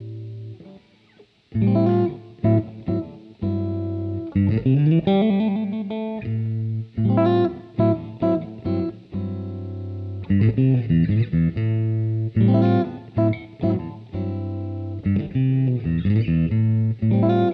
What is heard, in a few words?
Strat61 Second Test